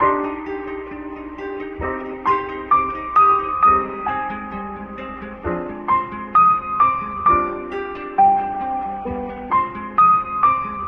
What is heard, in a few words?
piano and guitar, Sad; loops